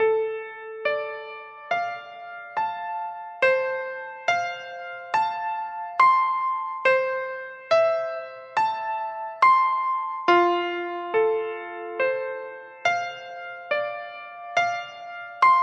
Piano; Type:Rider; PS Vita; Didot Piano 2

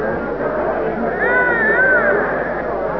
Crowd; simtower

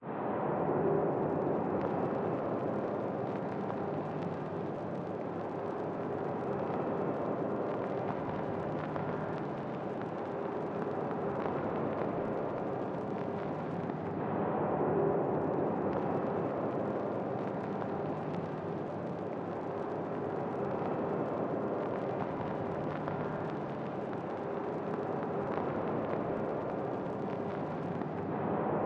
City Ruins Stem; Epitasis

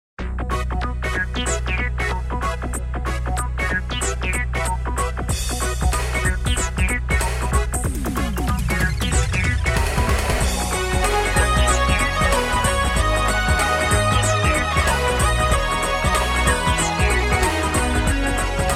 Funny Step Beat; Technosoft Game Music Collection Vol. 8: Dimension

